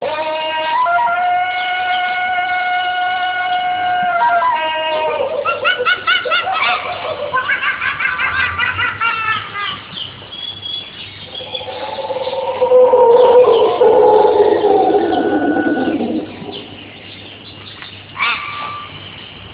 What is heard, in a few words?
Jungle Sounds